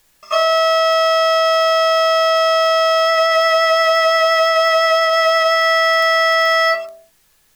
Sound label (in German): Geige mit Vibrato; Violin; Physical Modeling of Musical Instruments